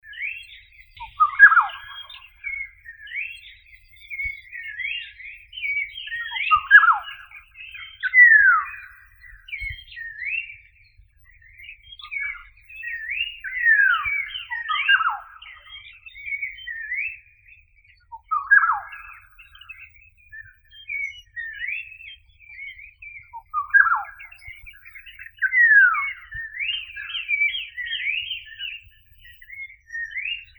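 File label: black-headed oriole, Chinese, Oriolus chinensis; ivolga chernogolovaya; kitaiskaya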